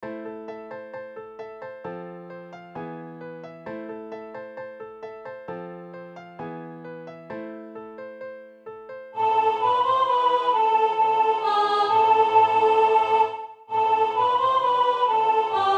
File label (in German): Sopran